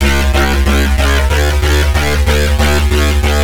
Bassline, Dubstep and Trap

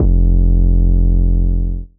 808 - F, Hip Hop Drums - One Shots; 808s

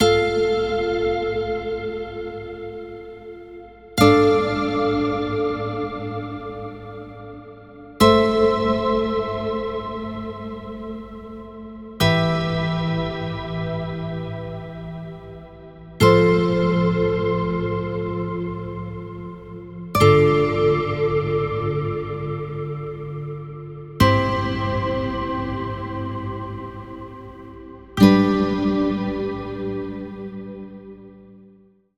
Nylon Guitar - Fm 60Bpm; Construction Kits; STEMS; Ghosthack; SkyRealm; Kit 04 - High Rock View

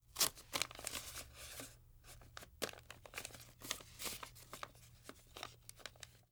Mail Handle; Fairlight Sound Lib

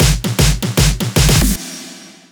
fill loop 155; GhostHack

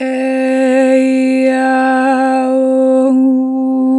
Adlib - 2 bar vib, Natural Vibrato, Vocals; Harmor Vocals

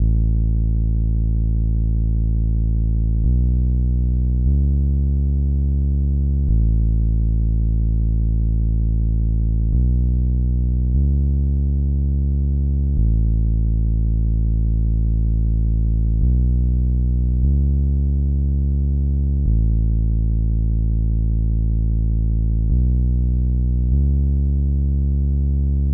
74 BPM C# Min Bass; Melody Loops; Amber Eyes; Cymatics; ANALOG EVOLUTION Beta; Lofi Collection

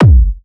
Techno, Kick; Techno Sounds 2024

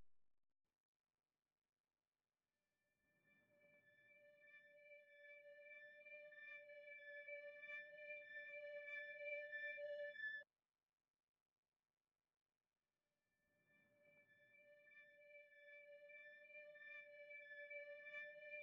92 BPM D Min Weird Synth; Melody; Loop Stems; Cymatics; 2022 Melody Collection Platinum Expansion - Pt 2; Top Floor

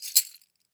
Double Caxixi; World Percussion; Cymatics; Emperor World Percussion

